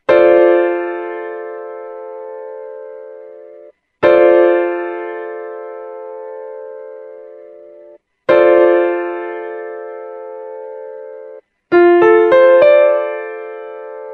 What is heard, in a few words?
F6 Piano Chord